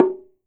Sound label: TANG KU, KOREAN DRUMS; AKAI S6000 CD-ROM - WorldSounds